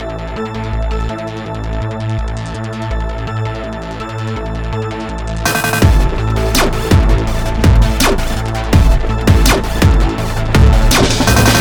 Dart (Instrumental)

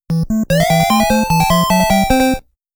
1-Up; Nichibutsu Shooting Sound Box

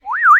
parrot; ice age scrats nutty adventure

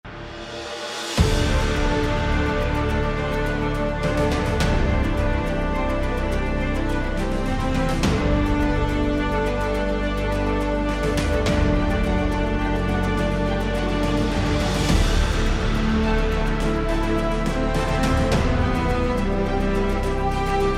Rabbit BGM; Giftpack

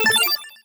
Missionsound Ingame

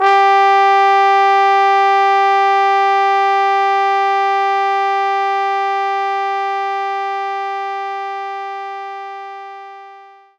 Trombone G3

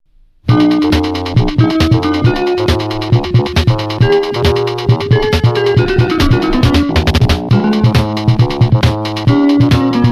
marimba; beep magazine vol. 5; fantasy zone ii - oba

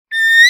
parrot; Level4 Hidden World

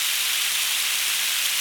water

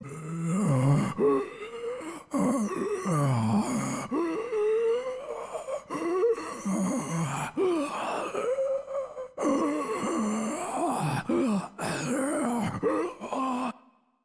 ZOM SOUND

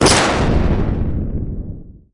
Bubble machine; Zenonzard

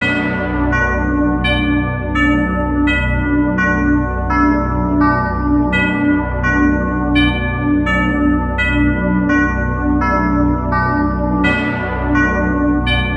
midi version

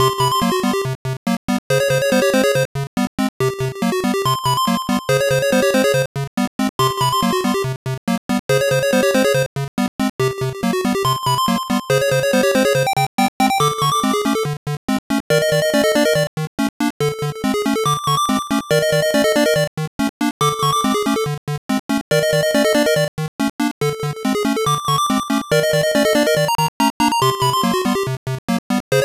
Minigame BGM; kikansha thomas ni notte pico gamerip 1995

